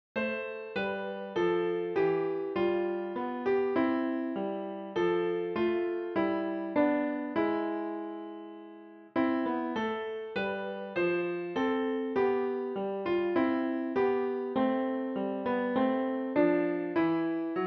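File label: piano, für Klavier, leicht; Ich steh an deiner Krippe hier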